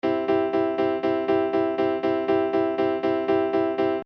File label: staccato